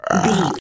zombie voice groan croak

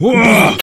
voice male battle shout

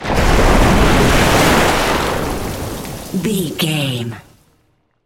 water spell wave crash

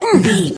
voice male hurt pain

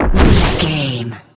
bullet leave barrel slow

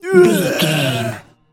voice male groan

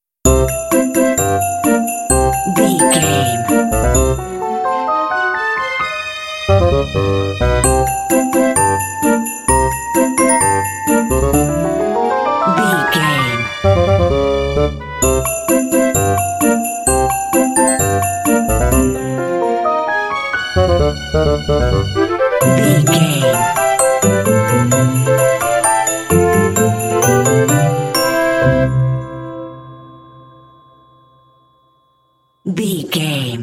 christmas sneaky cute story

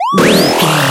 retro powerup collect

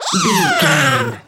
goblin fairy battle shout

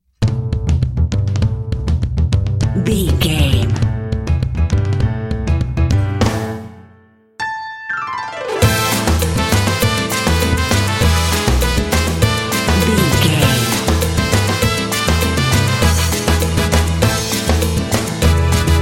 latin bust out

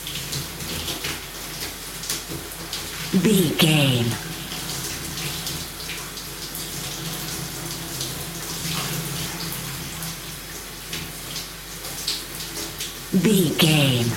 water running shower dripping loop

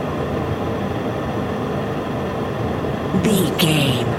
background fan air vent loop

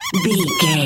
voice fun creature squirrel

